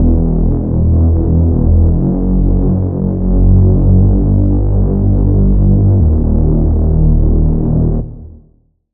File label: Reese; Vital Expansion; Street Vanquisher Vol.1; Routed Sound